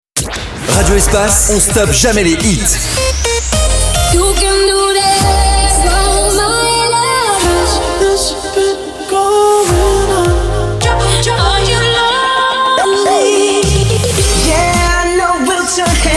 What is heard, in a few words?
Beat Mix; klemprod; ESPACE